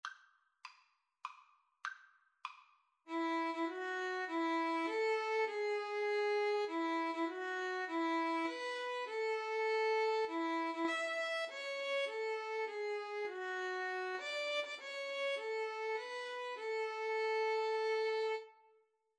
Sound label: happy birthday Violin Guitar duet